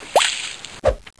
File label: Water, 自然, 水声; 特酷声音素材库